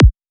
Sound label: kick cool